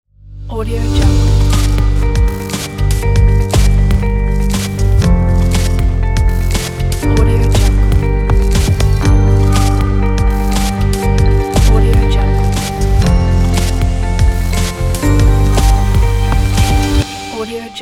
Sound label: inspiring tech logo